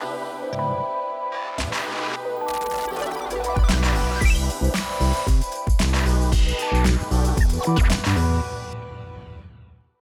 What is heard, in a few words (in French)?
Jingl Fete de la radio spot